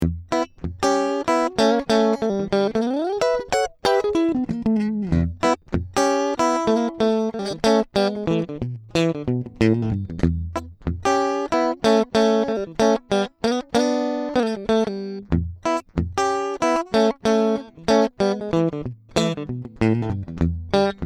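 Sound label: Clean Vibro King unprocessed; fender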